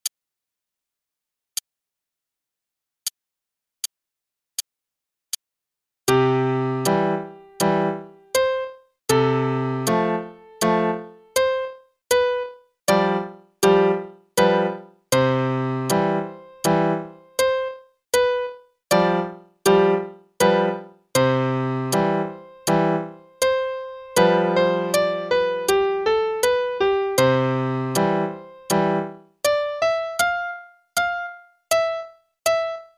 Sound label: Trumpet Concerto (qn=80); Haydn; Faber; Adult All-in-One Book 1